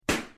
plop

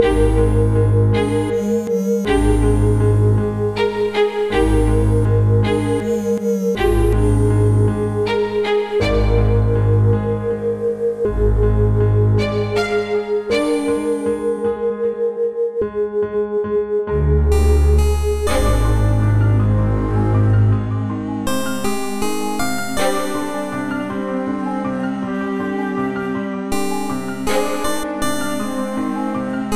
Lighthouse BGM

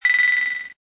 start

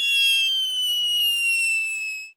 tin grab bomb drop; 2fast2curious

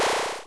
equip; doublespeakgames

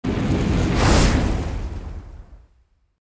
launch; Kung Pow

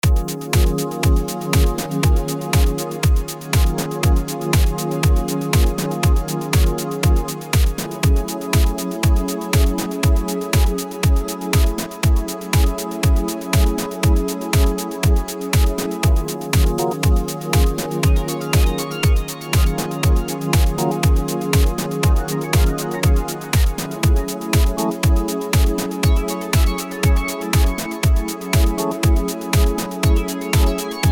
moare BPM120; 393.bz

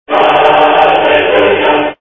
success; demolition 2

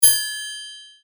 triangle; anno-1404

